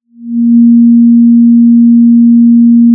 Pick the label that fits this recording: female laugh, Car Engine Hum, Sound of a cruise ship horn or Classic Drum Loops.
Sound of a cruise ship horn